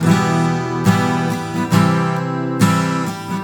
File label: GTR, Celtic Flavours; USB Soundscan vol.42; AKAI